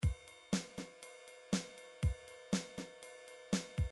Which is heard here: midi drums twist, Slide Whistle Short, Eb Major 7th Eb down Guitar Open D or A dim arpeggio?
midi drums twist